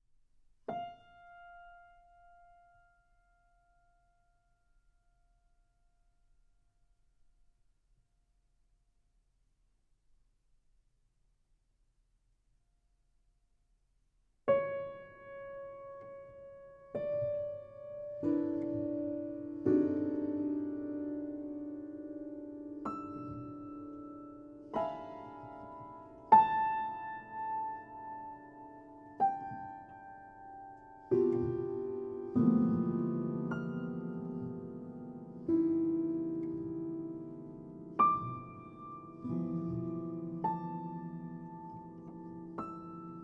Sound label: layers for piano